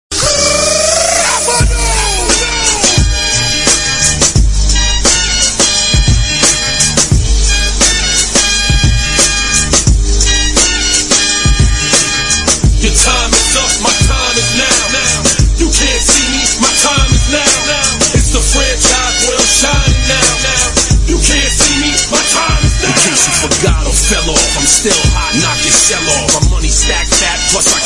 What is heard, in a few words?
Entry Music Ringtone